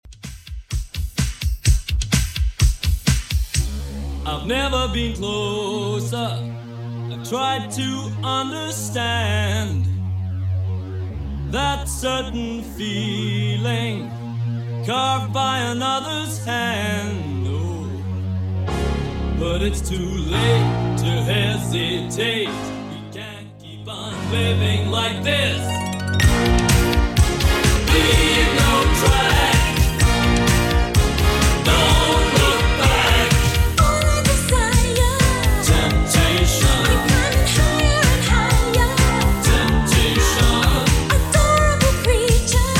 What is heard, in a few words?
80s Redrum 127bpm